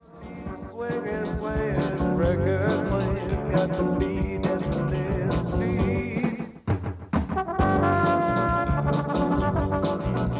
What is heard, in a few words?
tbns, Brass; tbn/brass/drms/arr